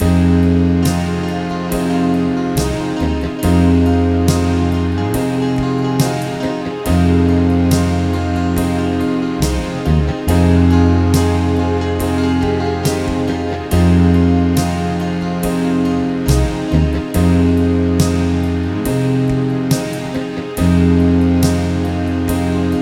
Bass Grooves; PARIS; USB Soundscan vol.30; AKAI

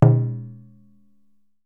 DJUN DJUN, African Perc; E-MU Producer Series Vol. 7 – Old World Instruments

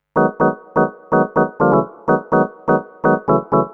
Organ chops (125BPM), retro house

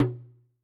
Talk D, Yamaha DTXpress-II, Perc

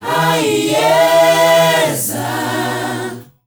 MIX.CHOIR; Spectrasonics; Heart of Africa 2

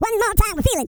Vocal, R&B & Dance; ILIO; Vocal Planet VOL-4

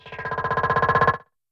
ASCENDER, Drum Machines, Effect; Yamaha DTXpress

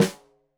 Snare Stereo, Drums; Deeply Sampled Drums; SMDrum

